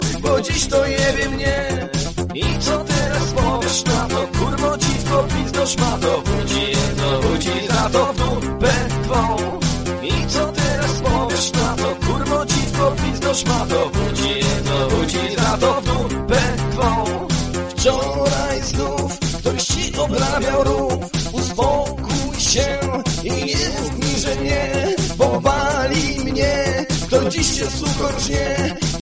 polish music

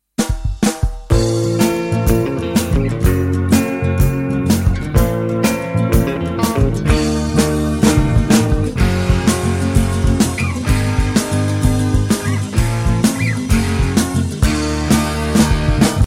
nepali instrument

